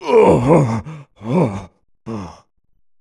evil death; buu

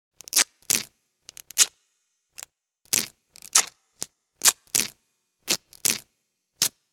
med charge

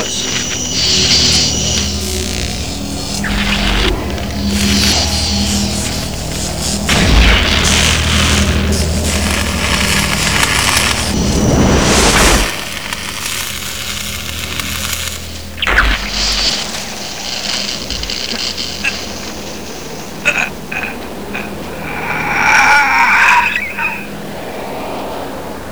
transporter

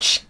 button click release

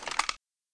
clip tap; mp7

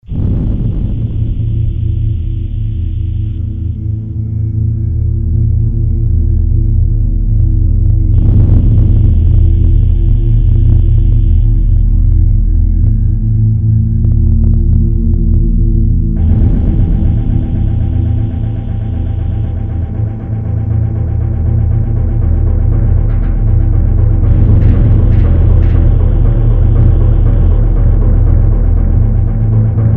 amb streert

